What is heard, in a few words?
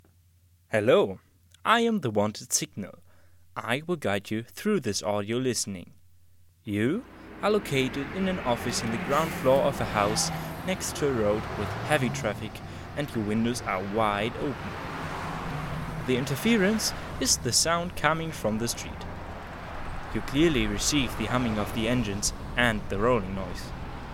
Auralisation sample traffic